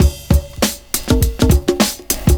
PERCS, 70 s Breakbeats

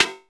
SD, 170 BPM, Drum & Bass; Ueberschall; Drum & Bass Flash Trackz

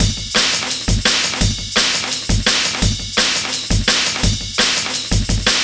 Rough Beat, Drum & Bass; Fluid Dynamics

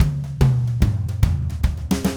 Red Maple Groove 110, DW Red Maple Kit

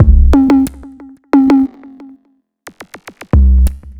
Robot Thunder, Modular Electronica; Sonic Foundry (Sony Creative Software)

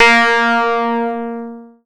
PLUCKED, Synclav FM, A#; Big Fish Audio

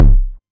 808 Kick; Novation DrumstationV1

